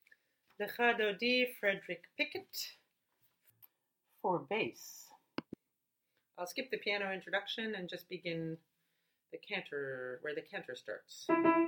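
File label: Lcha Dodi bass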